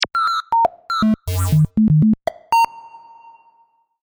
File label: call mail; HTC; Infobar-A02